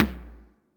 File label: Toms, Elektron MachineDrum SPS1 MKII